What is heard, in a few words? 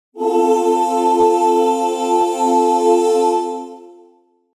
jingle chorus